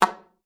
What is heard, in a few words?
SLPA F#3, ALTOSAX; Xsample VOL-2